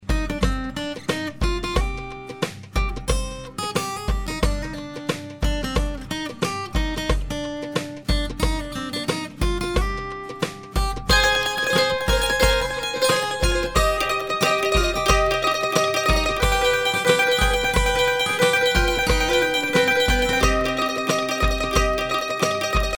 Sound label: Interlude Mandos; occurs only in interlude and only in 2nd half